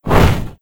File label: Open Chest